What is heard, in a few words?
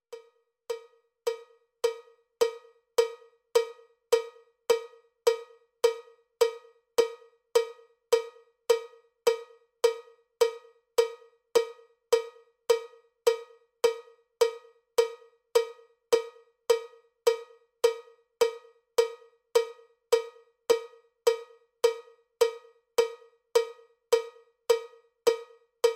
cowbell click track bpm 79; cowbell click track bpm 105